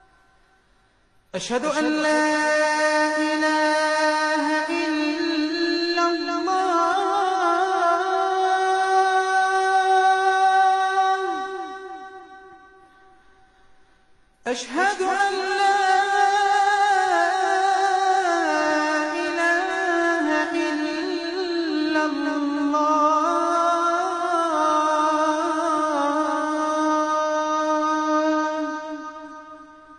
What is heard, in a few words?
Azan Abu Dhabi; Azan Abu Dhabi 2